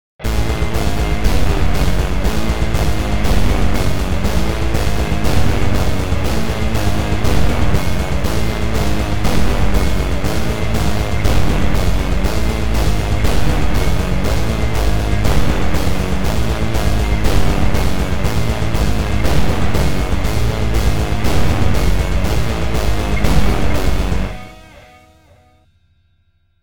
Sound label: MOOG